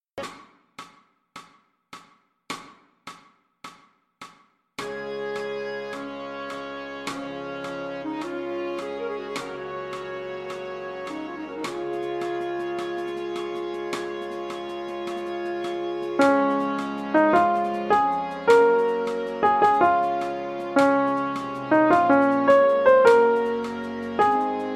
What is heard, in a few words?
Soprano Backing Track